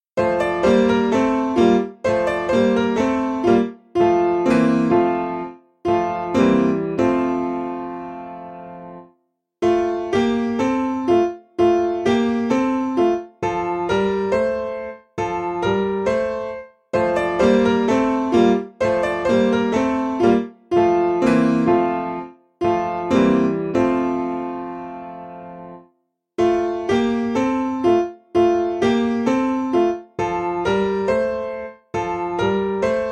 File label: Piano